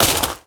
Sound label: Bodyfall Gravel; SFX Kit; Bodyfalls